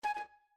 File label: User Account Control; Savanna